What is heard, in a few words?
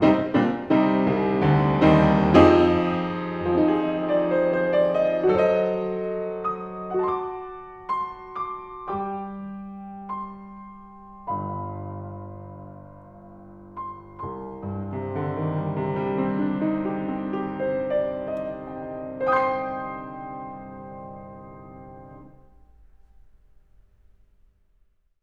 Piano Location Against Wall